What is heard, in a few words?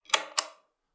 Pullcord Switch